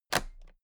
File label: toolbox latches close heavy